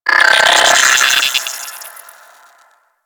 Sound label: Space Drone B Attack D; Warframe; Corpus Space Drone